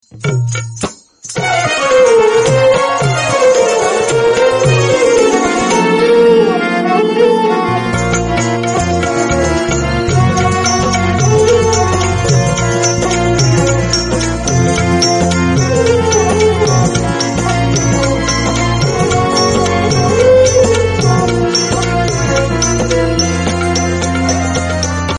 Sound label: marathi music tune